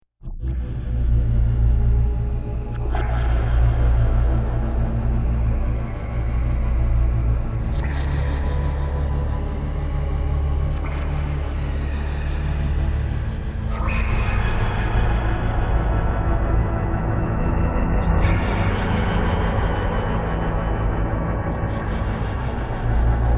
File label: Drone SciFi Horror Mystical Underwater, Drone Scifi Mystical Underwater Ambience; Scary Dark Atmosphere Submarine Diving; Sound Design